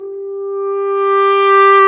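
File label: brass, F.Horn Swell; Roland L-CD702; F.Horn FX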